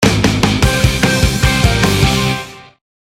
POP GENERIC

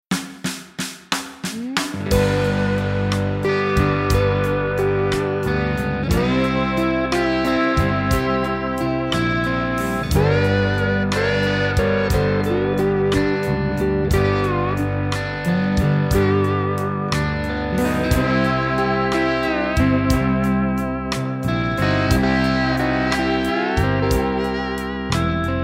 BLUES

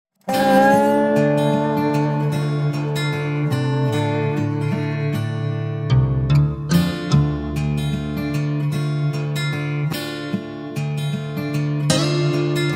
OPEN OCEAN STRINGS

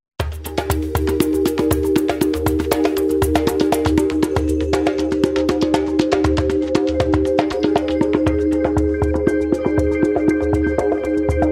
TRIBAL LOUNGE

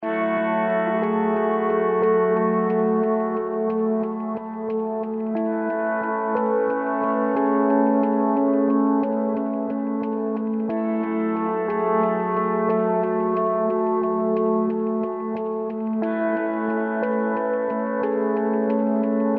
DEEP WAVES

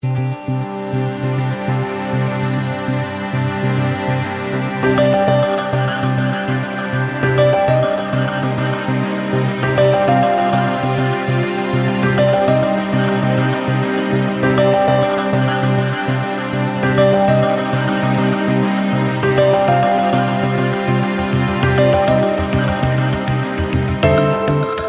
PAD TO PAD

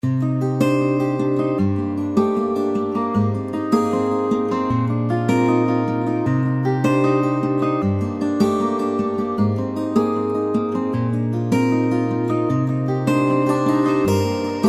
NYLON WOOD

